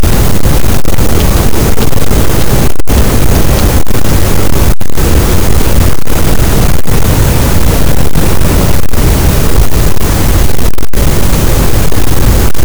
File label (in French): cachalot, Physeter Macrocephalus, gaussian noise